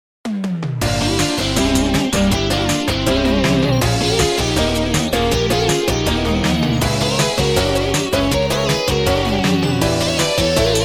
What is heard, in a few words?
Back To The 80 S